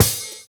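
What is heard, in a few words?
open hi-hat, Roland MV8800 drum kit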